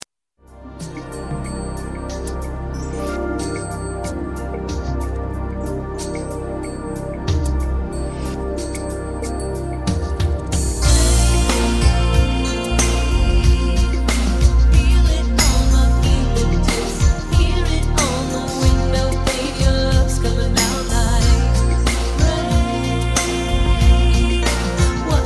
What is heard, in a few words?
Rain Radio Remix Instrumental